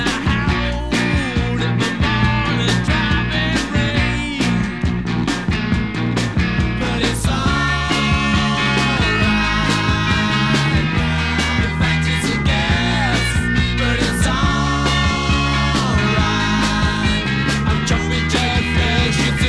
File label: rock